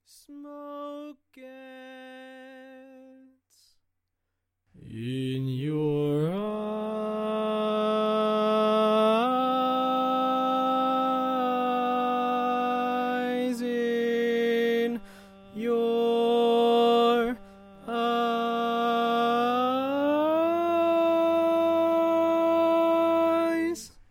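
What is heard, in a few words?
barbershop tags, baritone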